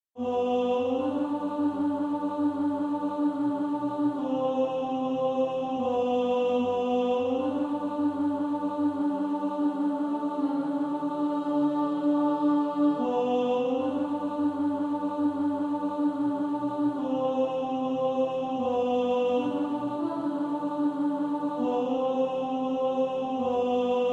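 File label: When the roll is called up yonder alto, choir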